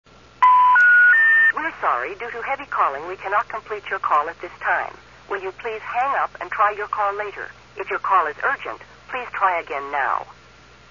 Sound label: heavy, bell